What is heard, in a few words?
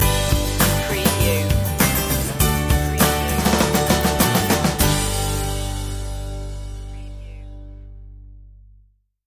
power of now stinger